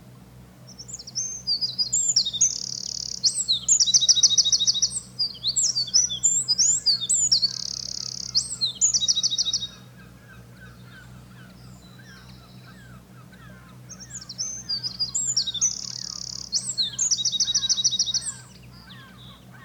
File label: Troglodytes troglodytes song